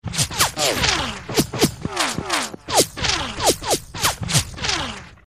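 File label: Crazy Closeup Multiple, bullet bys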